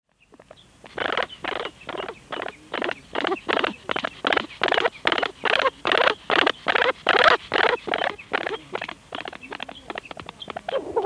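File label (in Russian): pheasant sound; zvuki ptits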